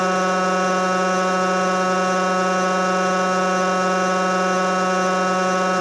ELECTRIC RAMP; SIMUZENSOUND

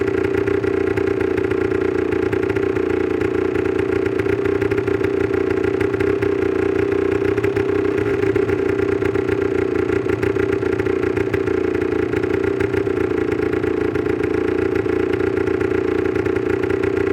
MOTO IDLE; SIMUZENSOUND

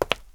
FOOTSTEP CONCRETE; SIMUZENSOUND

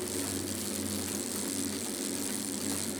ENTITIES BIKE MID; SIMUZENSOUND

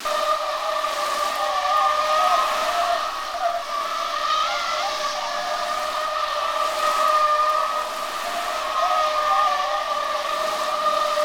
SKID WET; SIMUZENSOUND

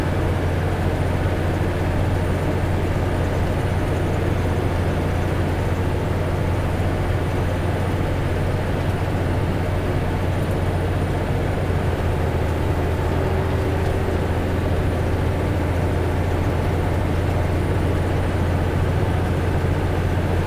DRIVE CABIN; SIMUZENSOUND

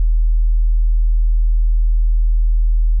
clutch vibration; Logsim

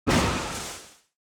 COLLISION CRISTAL; SIMUZENSOUND

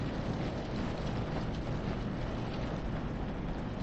ASPHALT FAST; SIMUZENSOUND

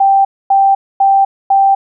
PARK SENSOR; SIMUZENSOUND

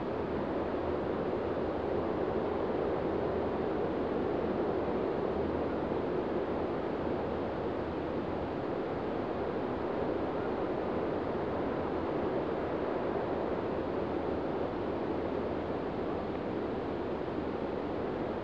WIND MED

